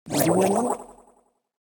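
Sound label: boardwalk attack windup; Fight Knight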